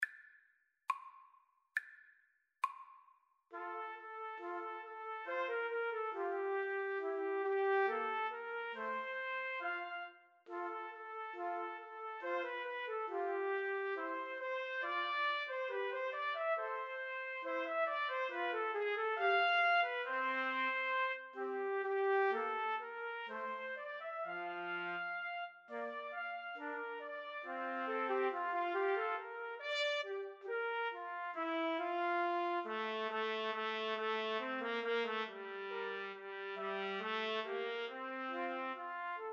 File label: 3TPT, Trumpet Trio